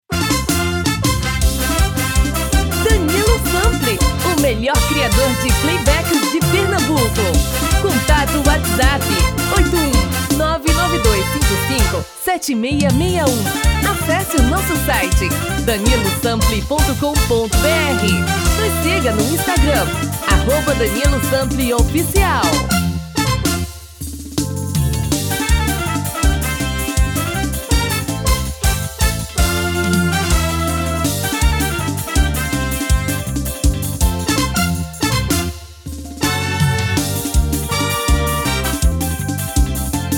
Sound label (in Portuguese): FREVO feminino; tom feminino